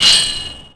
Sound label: grind metal pole off, terrains; Tony Hawk's Project 8; XBOX